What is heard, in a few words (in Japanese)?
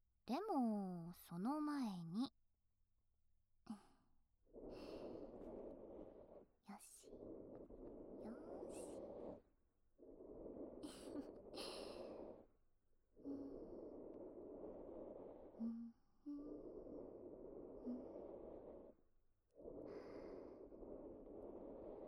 癒しのチタン製耳かき（右耳）, ASMR